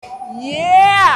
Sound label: yeeeh